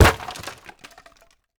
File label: wood break; teardown; ps5 windows xbox series xs